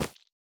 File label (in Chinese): 蛋糕 插上 蜡烛声